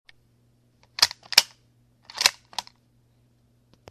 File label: Mosin Nagant rifle